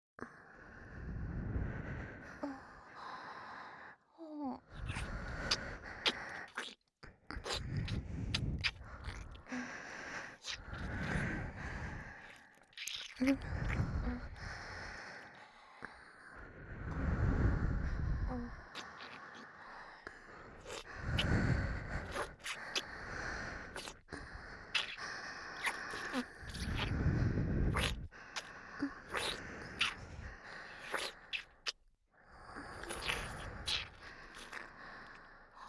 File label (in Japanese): 両側吐息＋ピストンフェラ